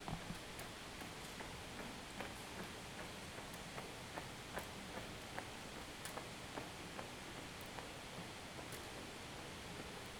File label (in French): Nantes - Matin - Passant course + Fontaine; Ville; ZoomH2N